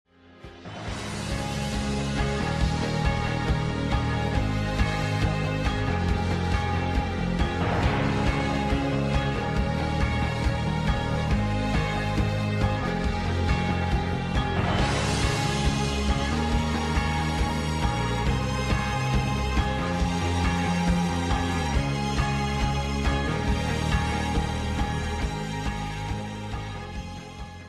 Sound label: intro music